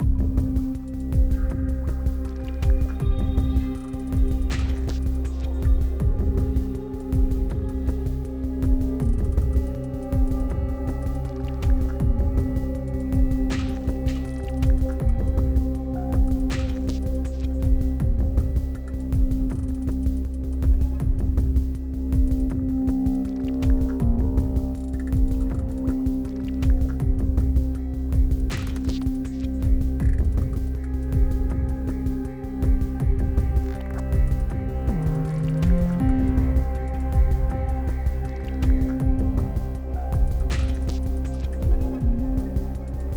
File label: Exploration 80BPM